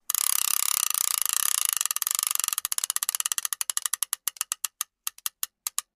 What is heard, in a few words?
Fishing reel being reeled in at medium and fast speeds, close up.; fo fishreel fast; Reel, Fishing Click, Fishing Reel Ratchet